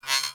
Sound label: Beep; Warframe; Corpus